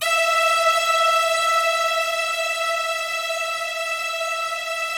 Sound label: BIG ORCHESTR, E4; Yamaha VP-1; Music Store; 90's Sample CDs